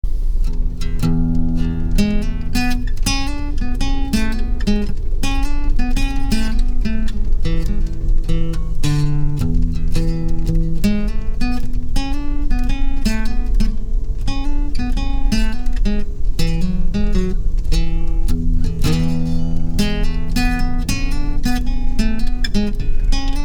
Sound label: Train Ride Melody